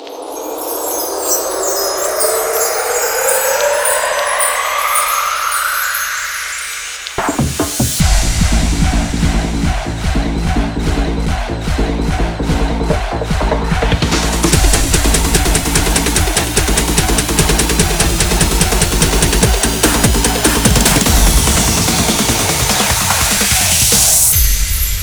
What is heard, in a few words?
Music Unlock Tap; D4DJ Groovy Mix; Android, iOS, Mobile